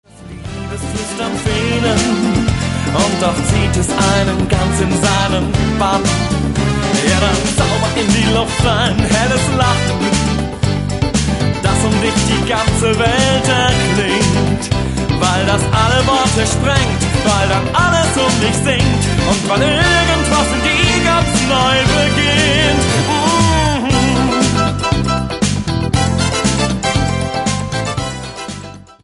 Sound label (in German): lachen